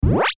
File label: DIGITAL ASCEND FAST; Multimedia; Sound Design; Blastwave